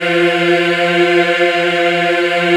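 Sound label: F3 men women eh, Choir; USB Soundscan vol.28; AKAI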